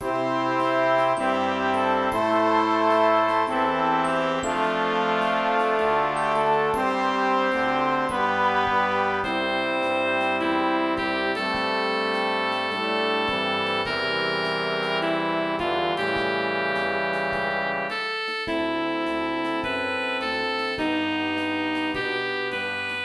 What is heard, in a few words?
Ten. Sax